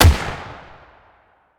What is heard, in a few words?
hmg Shot; Security; WEAPON